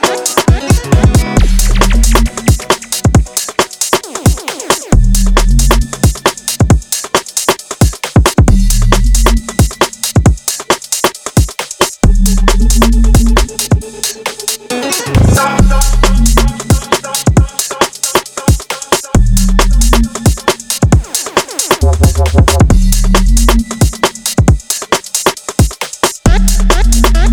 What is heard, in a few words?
UKG Dub